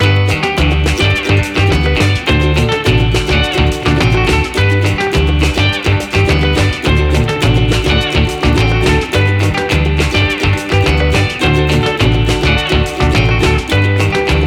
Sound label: Timer Music; Fakin' It; Jackbox Games; The Jackbox Party Pack 3